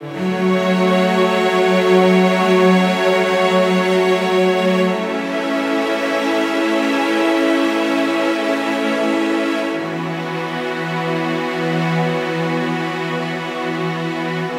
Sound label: Strings - F#m 100BPM; STEMS; Ghosthack; SkyRealm; Mood Kits; Mood Kit 04 - Travellers Heart